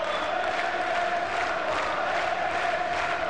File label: crowd